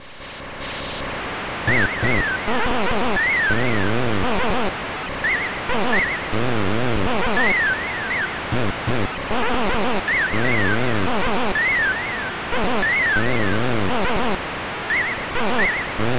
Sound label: CHEER; beatmania GB2 Gatcha Mix